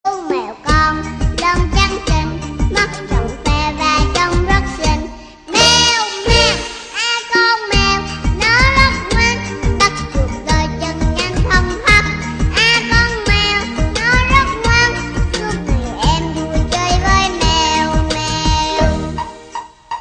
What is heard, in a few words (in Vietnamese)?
chu meo con